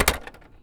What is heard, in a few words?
Plastic Rattle; BB Retail Therapy Sample Pack